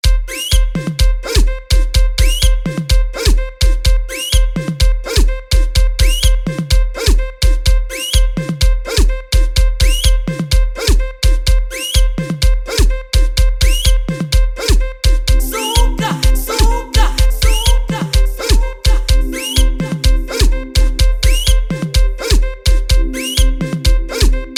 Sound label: Bass Prayer